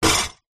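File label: Dish Clink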